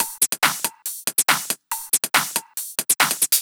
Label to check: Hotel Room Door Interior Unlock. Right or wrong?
Wrong